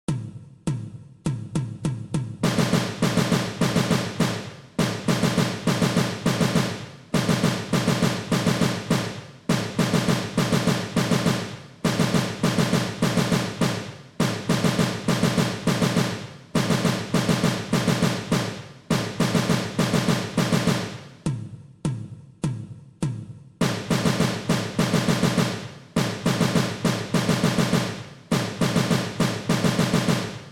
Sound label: Taps - Diddles 2 @ 102 bpm; percussion methods